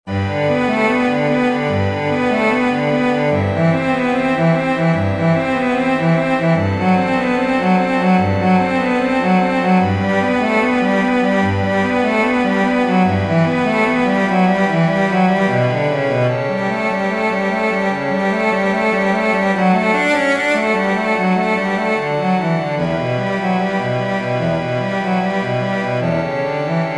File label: Cello Suite N. 1 - Prélude